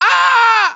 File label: hurt heavy; cj